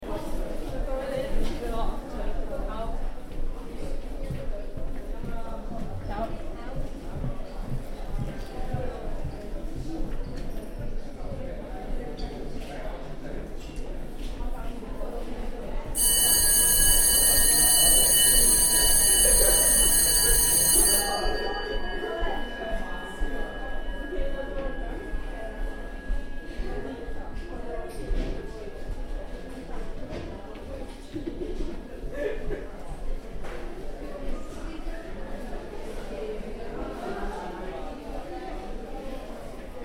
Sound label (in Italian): suoni campanello; borgo sacco; depero